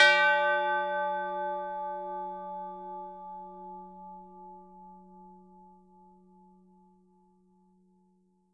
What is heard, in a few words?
tubular bells hit D4; AO bell